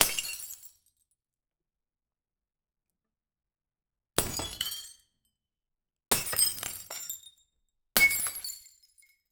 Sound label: large glass mirror into p